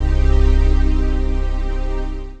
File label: PAD